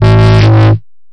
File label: distorting, FNC Bass